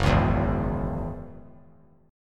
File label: Gb 7th Suspended 2nd Gb, Gb7sus2 Chord; strummed; JGuitar